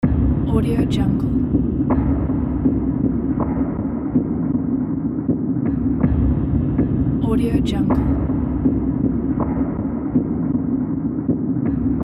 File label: cyberpunk machinery atmosphere loop